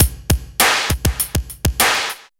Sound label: BPM100 SYN